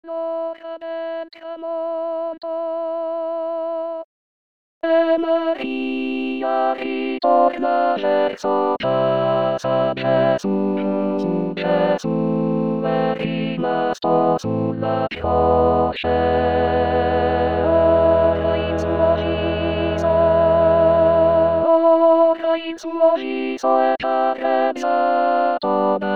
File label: Ora Nona tutti